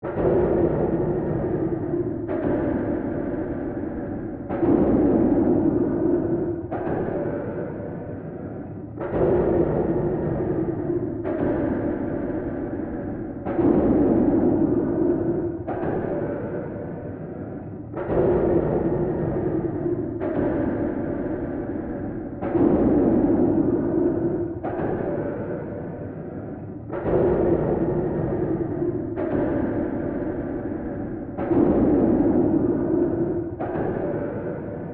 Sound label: Ambience Machine Pu; industrial ambience